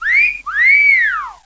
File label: CATCALL, HUMAN